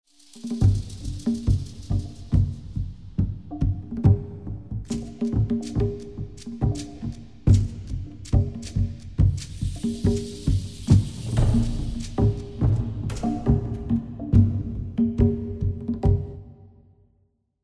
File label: Drum B